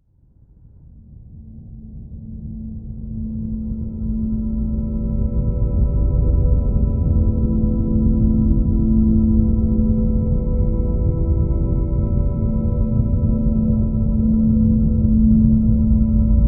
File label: box tone